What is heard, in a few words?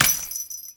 Jester Step Default, Grineer Royal Jester Foley Footsteps; Warframe